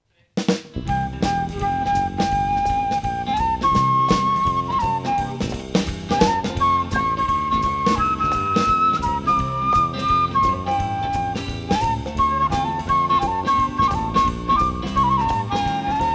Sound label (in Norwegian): 12 takter blues